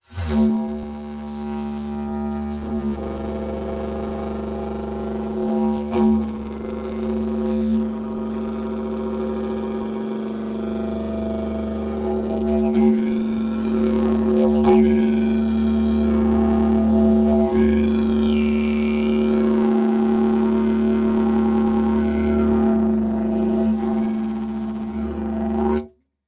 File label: Didgeridoo Drone